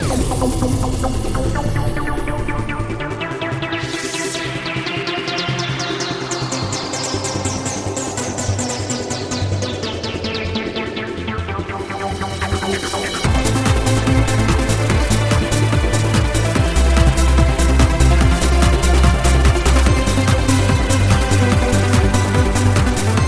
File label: bgm